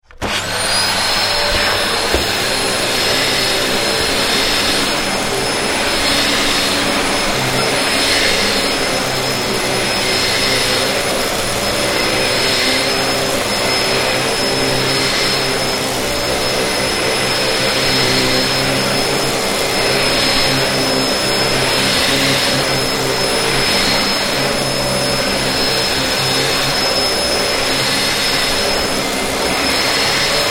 Hoover, Dyson on board - start - hoovering carpet - off; Appliances; Household; Tom Hutchings